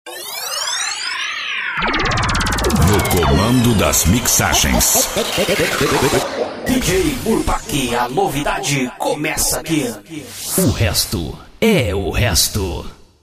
vinheta

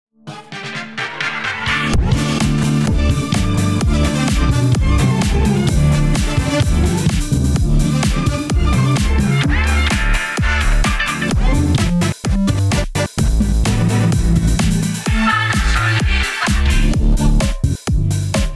future funk remix

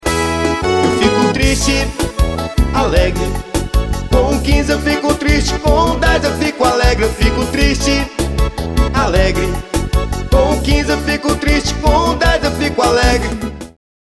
toque, forro

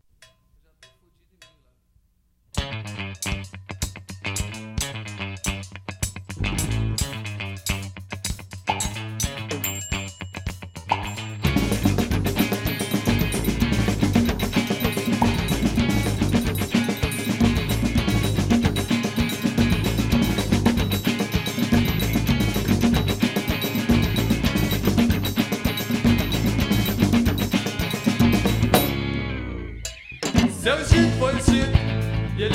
maracatu

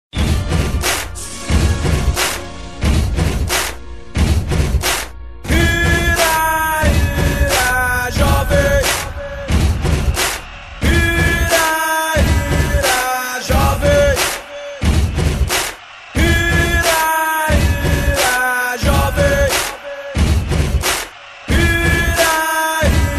vinheta; ira jovem

